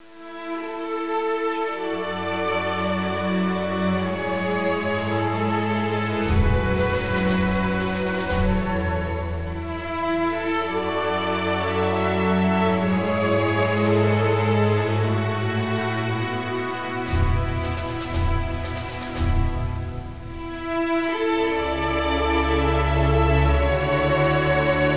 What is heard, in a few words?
start music; overlord